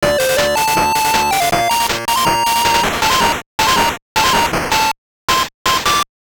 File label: Jingle, WonderSwan Color; battle spirit digimon frontier